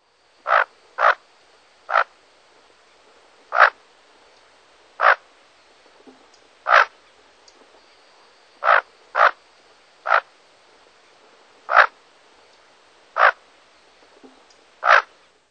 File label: Bufflehead; Birds